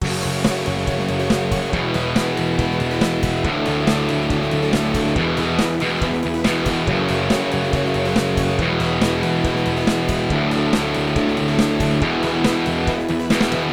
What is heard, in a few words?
Riff Rocker